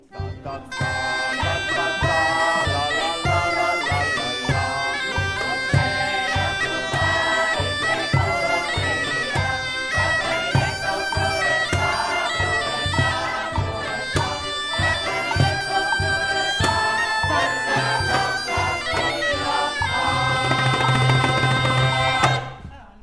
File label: Catarineta